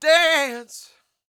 DANCE; Spectrasonics Vocal Planet

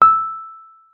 Wurlitzer E6; Xsample VOL-1; 90 s Sample CDs; WURLITZER B

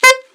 ALTSAX D3; Best Service; Alpine Volksmusik

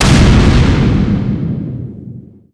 FX HIT, Techno Trance; Ueberschall

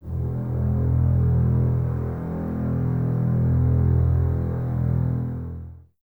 Basses E 1, f Full; String Ensembles; Miroslav Vitous; 90 s Sample CDs

